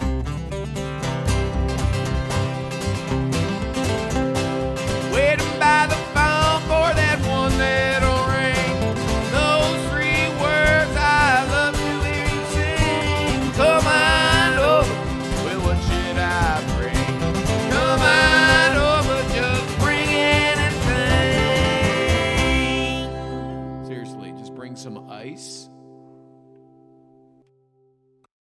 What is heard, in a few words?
Theme Bluegrass